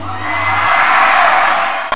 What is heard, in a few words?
WIN